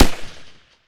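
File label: percussion rifle, guns tuned; Roland - Rhythm Section; Guns & Glass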